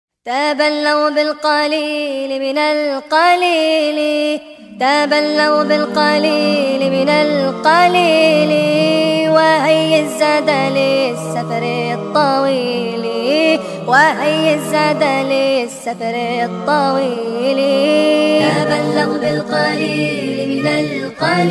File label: nasheed sped up